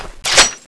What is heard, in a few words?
jet gun draw, weapons